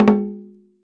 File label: daibyoshi A3, Japanese Drums; ETHNIC and WORLD PERCUSSION